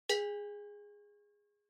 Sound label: Cowbell (Dry)